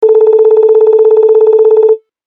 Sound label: Low Beep; LG CU405